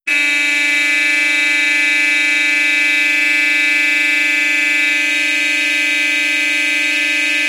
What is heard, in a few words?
carhorn